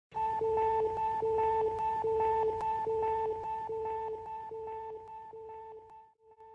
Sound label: sounder fade